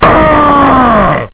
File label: arrgh; spacehulk